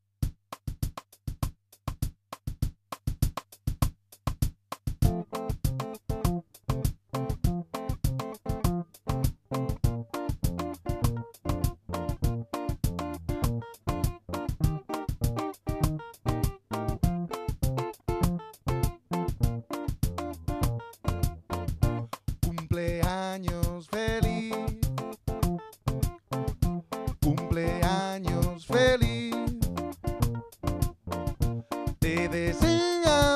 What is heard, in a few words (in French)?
Joyeux anniversaire Cumpleaños feliz chanson espagnole